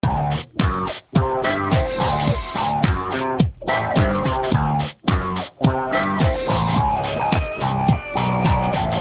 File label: comic; Siemens CX65V SFR